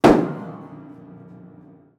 Piano Destroyed demolished broken Wurlitzer Upright Impact string note hit ringing out; buzzing; Matt Script